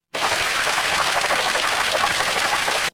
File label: water stream